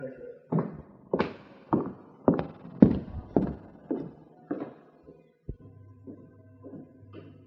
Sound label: Footsteps Mono